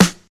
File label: dry steel snare drum sound f key, snare drum sound tuned to the F note; snare drum samples